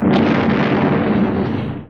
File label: EXPLOS; Best Service; Advanced Mega Effects